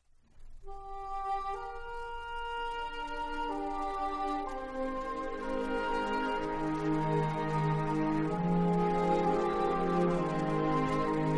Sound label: Instrumental